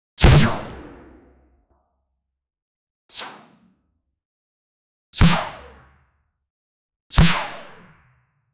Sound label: three magical darts being, dart